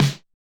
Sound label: disco snare; Electro